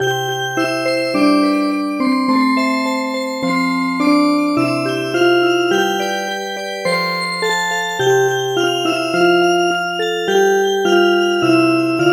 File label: Music Box